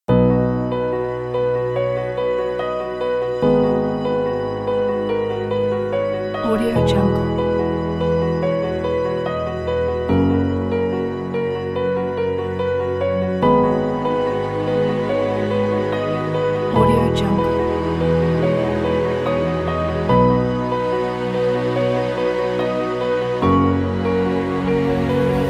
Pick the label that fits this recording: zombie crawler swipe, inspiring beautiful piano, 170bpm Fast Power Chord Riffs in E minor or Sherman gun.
inspiring beautiful piano